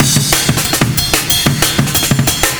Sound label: processed breaks compressed 185bpm